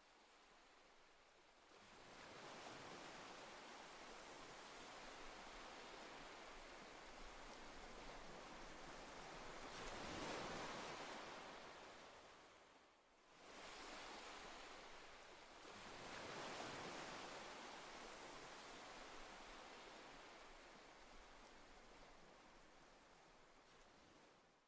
Ocean Waves Sound

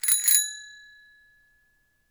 bike bell ring; mixkit

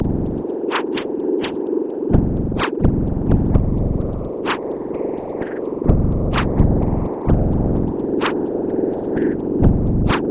generated beat

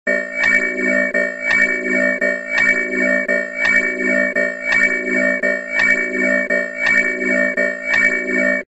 Intruder Alert; open-crypto-tracker

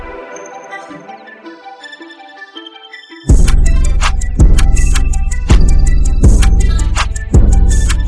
generated beat

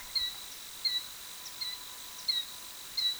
Killdeer; west lake

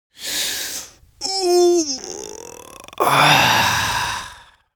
Human

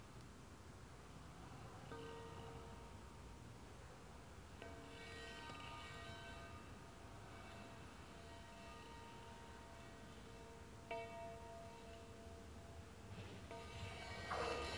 Mechanical Time Train passing; for Goodmesh Demo

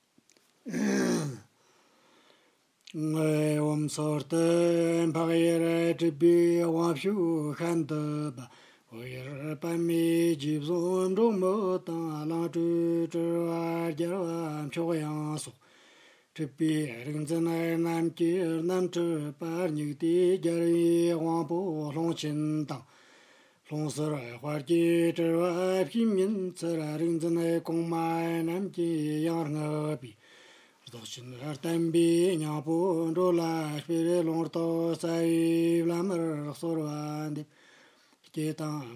Tibetan Supplication; Tibetan 8 Supplication to LAR

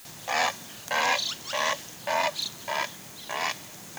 Great Blue Heron; west lake; Ariel Foundation Park

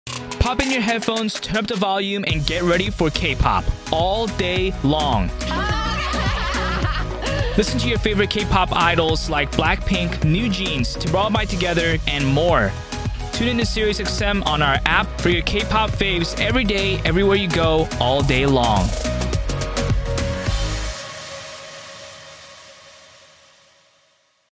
K-Pop Promo Supercut; siriusxm

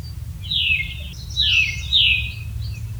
Tufted Titmouse; woods; Ariel Foundation Park

